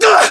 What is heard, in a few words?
pain, english